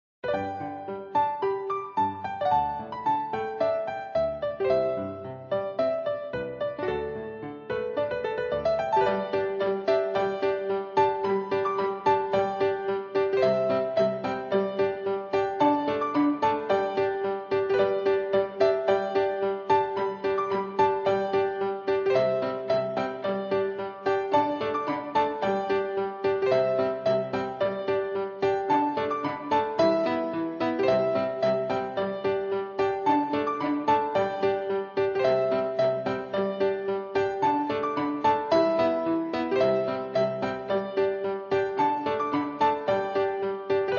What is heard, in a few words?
Strings and Piano; String Together